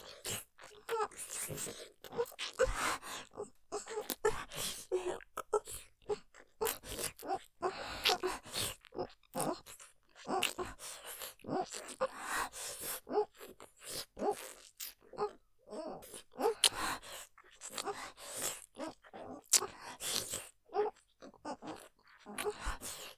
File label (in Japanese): フェラ音マシマシ 吐息多めに さらに激しく ぬるぐちょフェラ; ステレオ